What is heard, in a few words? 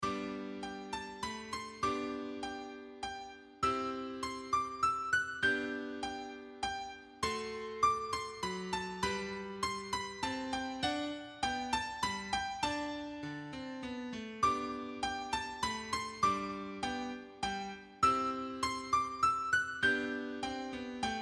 bach minuet, recorder